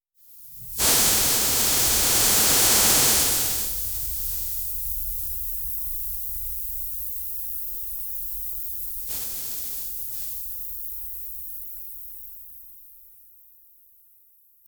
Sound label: thick smoke fills the air, atmosphere